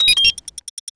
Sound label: ui suitcase bomb double; user interface